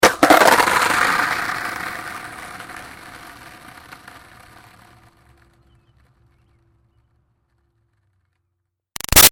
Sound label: Skateboard Drop Crash Rolls Away; Skateboarding; Sound Effects:Sports; Big Room Sound